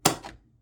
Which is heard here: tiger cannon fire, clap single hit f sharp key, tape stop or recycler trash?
tape stop